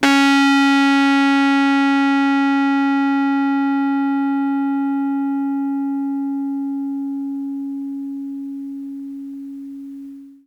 RHODES FUZZ

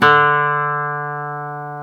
GTR Nylon, Roland; Club-50 - Foundations Roland; Nylon Martin

